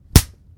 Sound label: body; 平手打ち３